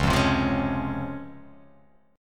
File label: Db Major 7th; strummed; JGuitar